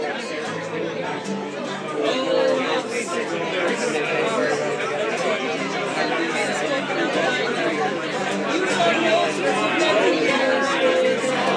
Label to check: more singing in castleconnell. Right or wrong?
Right